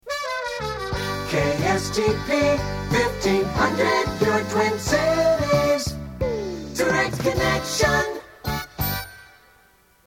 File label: Jingle; KSTP AM; 1982; Direct Connection